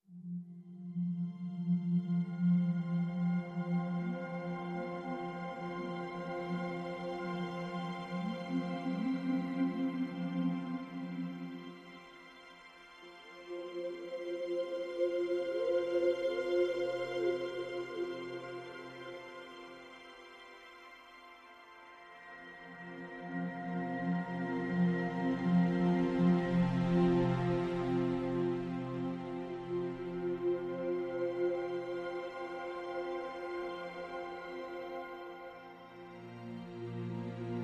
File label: Pads and Strings